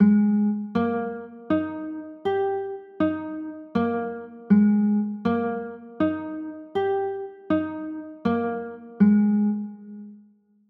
g sharp maj; maj9

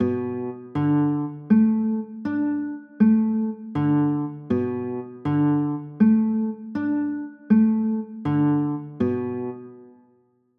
b m7, chords; pos 7

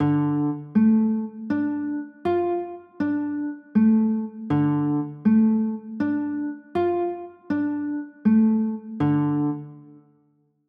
d m, chords

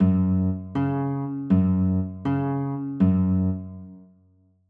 f sharp 5, chords

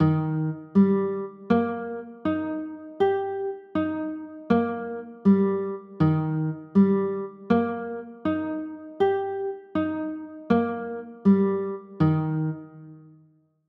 e flat maj, chords

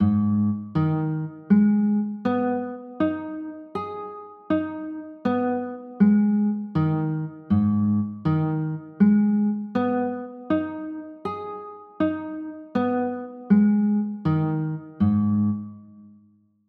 a flat m, chords

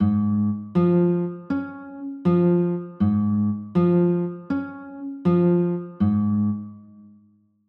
d flat maj, chords

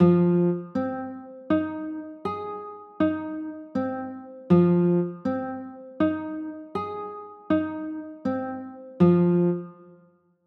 f m7, chords

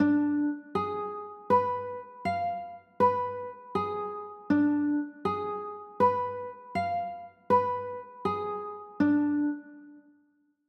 g 7 b9, chords

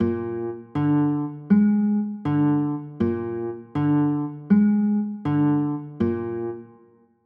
b flat 7, chords

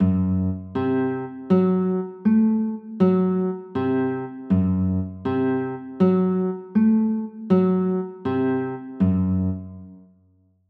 f sharp dim, chords

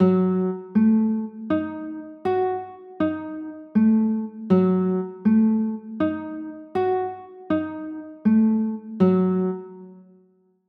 g flat m6; chords

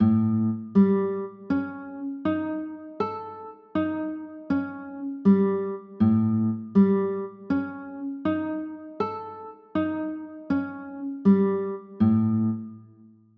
a 7b5, chords

